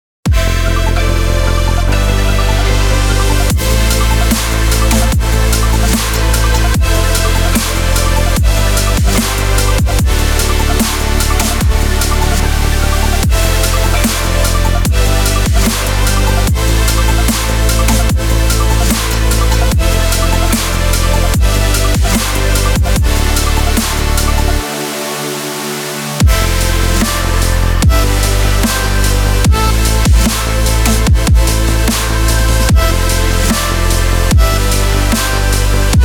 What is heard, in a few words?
emotional energy dubstep